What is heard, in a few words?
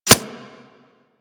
Arrow Impact Default; Warframe; Weapons